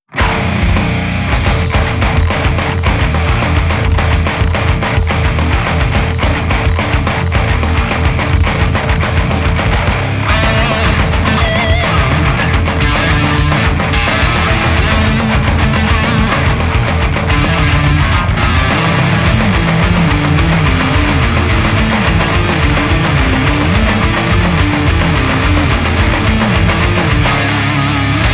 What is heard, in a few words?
Fast Run